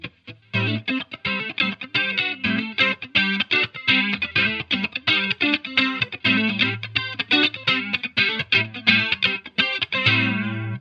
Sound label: funky